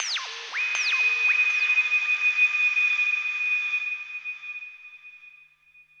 AMBNT 160; eJay; Ambient

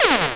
fire

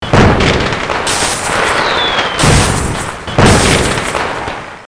jungle; PUZMANIA

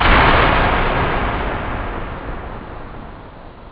slam

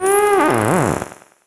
stingray hit; DAoC

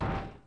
Fix; Net Power 2000 #9; Fortress2; OnlineGame

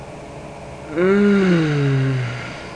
pleasure, People; System7

